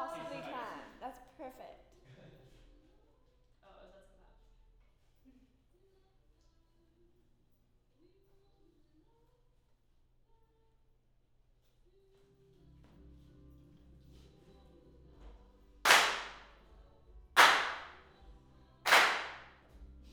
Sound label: Claps